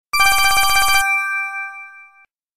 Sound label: Basic tone